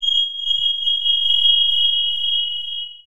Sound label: EQ Perc, Percussion Mix